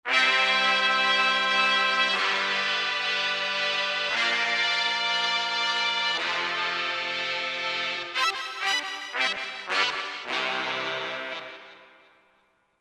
Slice, Latin; World; Roland SR-JV80-18 World Collection Latin

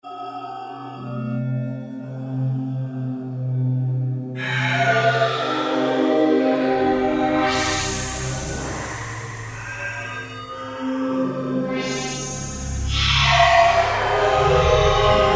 Intruder FX

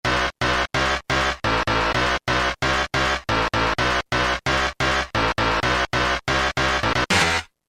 Saw Horn x2; Roland M-DC1

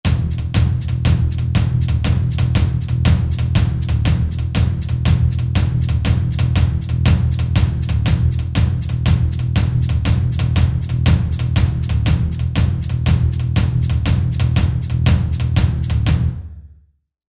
Rock

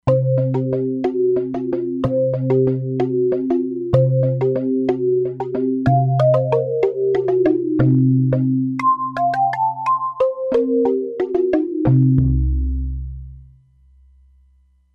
Balafon, E-mu Proteus-3; Factory patches

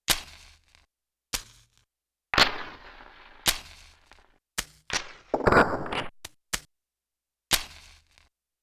Fire Match; Yamaha TX16W; Sound FX

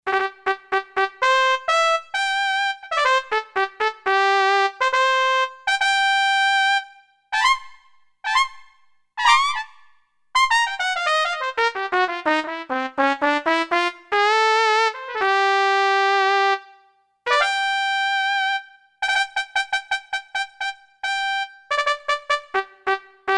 Trumpet

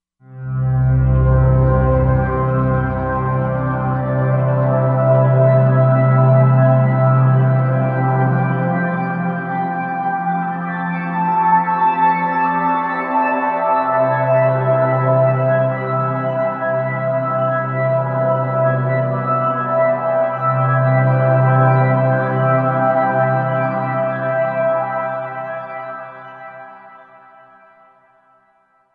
Shangri-La, Roland Fantom XR

